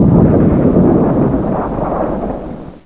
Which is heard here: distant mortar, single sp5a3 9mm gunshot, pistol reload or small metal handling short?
distant mortar